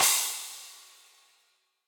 Crash FX17o14 Cold Tight Room, Cymbal; Drums; Drum Hits; Standard Hits; Session Drums Studio

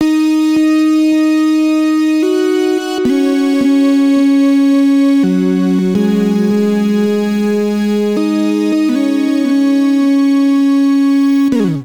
Bb Minor 81 bpm; MIDI Clips; Tonal; Melodic; A Kinder Bud; Ableton; Factory Packs; Chop and Swing

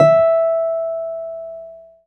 E4, Keyboards; Model-C; Ableton Foundation FreePacks

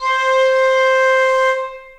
Piccolo Section Legato; Ableton; Orchestral Woodwinds

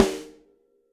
Snare Rim Session Dry; Drum Hits; Standard Hits; Ableton; Factory Packs; Session Drums Studio

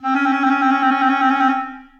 Eb Clarinet Solo Half Step Trills; Ableton; Orchestral Woodwinds

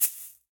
Shaker; Latin Percussion; Drums; Drum Hits; Ableton; Factory Packs

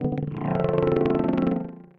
Piano & Keys; Inner Travel Mixtape

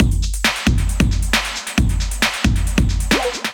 Kit 135 bpm, drums; MIDI Clips; Bark Park Kit; Ableton; Factory Packs; Skitter and Step

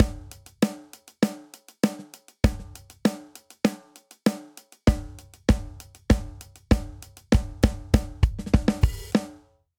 Straight Hip Hop Buildup 98 bpm; 4 4; Ableton; Factory Packs; Drum Booth

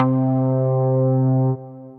Afterfade Chord, Synth Keys

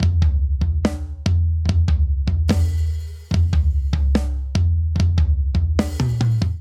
Heavy Rock Intro 145 bpm; MIDI Clips; Ableton; Factory Packs; Drum Booth

